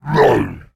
Creatures Fight Attack; Mighty Party; Android

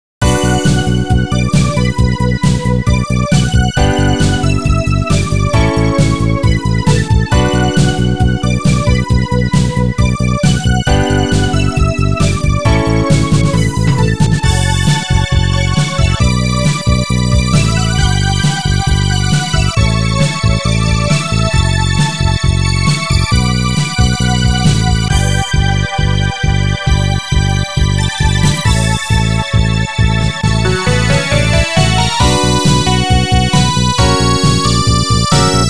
All Clear; Raiden; Raiden II

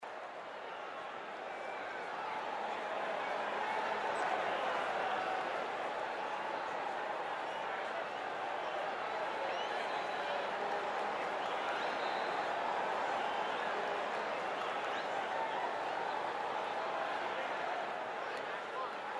Race Environment; U.B. Funkeys

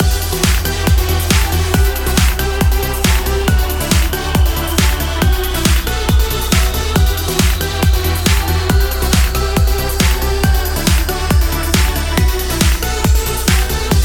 bgm speed; Rhythm Stars Climbing

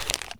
paper crinkle

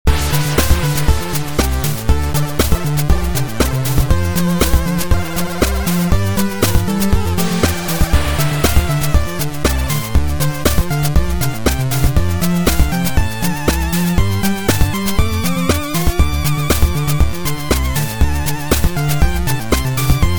song; Robot Wants Fishy

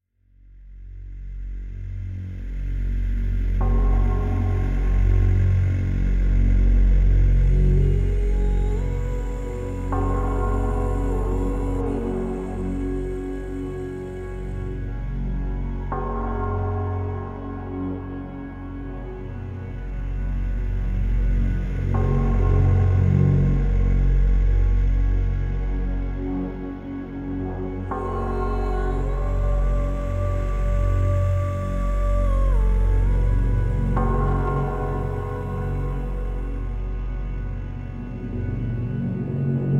Tension After The Battle vocal; Real Warfare 2 Northern Crusades

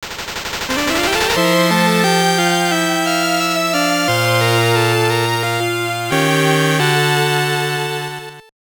Jingle, soundtrack; Uchuu Senkan Yamato; WonderSwan Color

